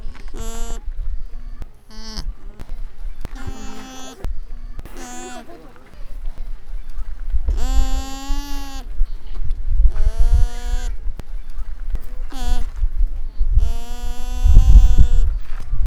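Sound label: Lama pacos juvenile; Alpaca; Nasal calls of a calf, soliciting for food from zoo visitors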